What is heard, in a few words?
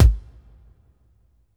KICK, hi-tech kits; Crypton - DrumBase5000